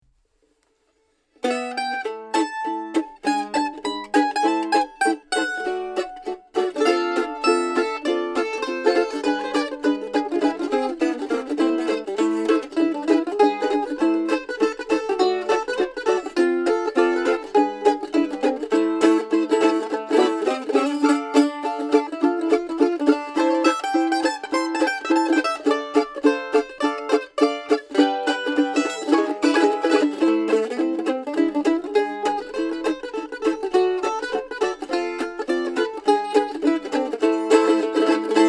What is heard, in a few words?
Mandolin FF